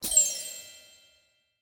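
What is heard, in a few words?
Gun Click; game audio; MiSide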